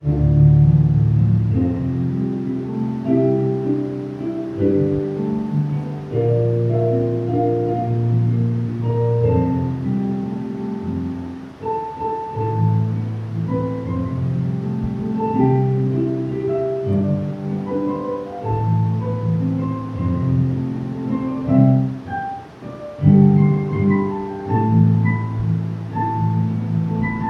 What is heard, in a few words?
background piano